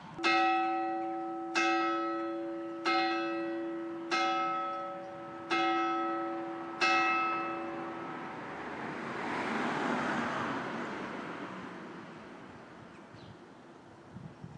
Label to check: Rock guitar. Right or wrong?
Wrong